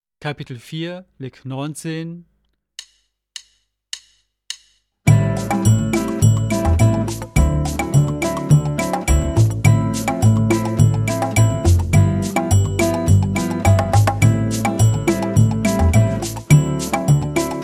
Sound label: Latin Style Guitar